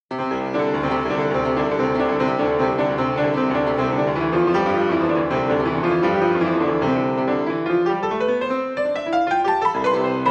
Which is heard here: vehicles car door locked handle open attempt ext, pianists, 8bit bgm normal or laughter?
pianists